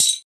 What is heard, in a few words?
glass hit